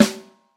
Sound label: snare drum a key; drum single hits one shots